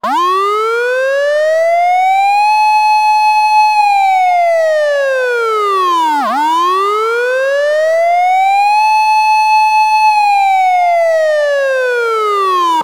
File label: ambulance siren